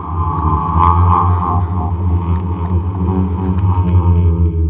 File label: error